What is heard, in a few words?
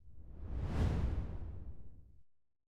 Swish Slow; Whooshes